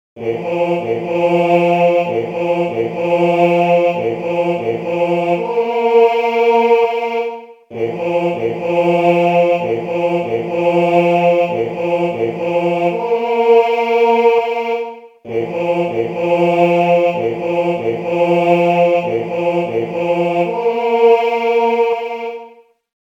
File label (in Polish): Apel na lowy, sygnaly mysliwskie; sygnaly mysliwskie ze slowami